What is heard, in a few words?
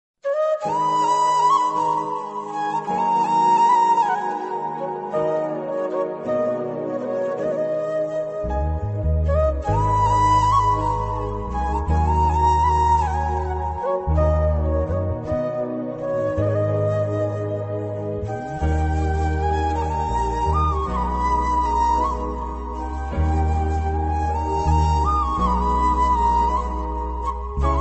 Sad Status Music Flute Ringtone